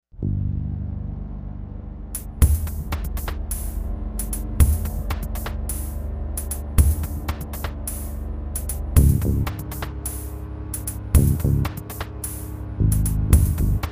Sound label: noisy loop